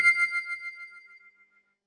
pluck

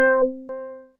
synth pluck

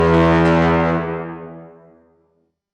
viking horn

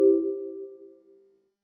synth pluck

